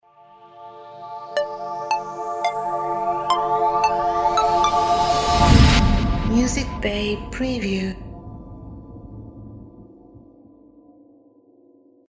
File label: Pluck Logo